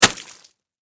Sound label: Impact Mud Hit; Miscellaneous Impact Sound Effects; Miscellaneous Impact (Sound Effects:Impacts)